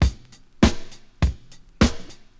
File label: 100 bpm modern breakbeat sample e key